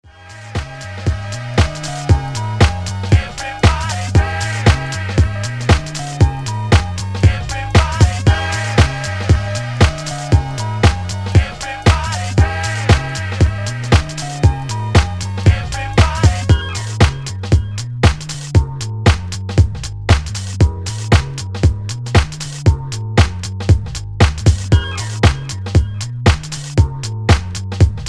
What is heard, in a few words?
Jazz Dance